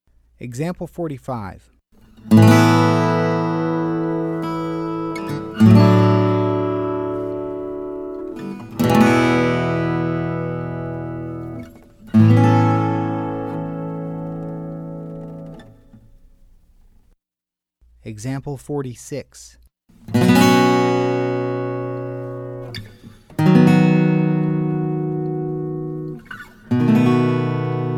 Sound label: Common Chord Progression